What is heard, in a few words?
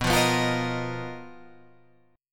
B Major 7th Flat 5th B down, chord shape